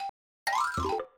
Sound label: Arctic Fox; Animal Genius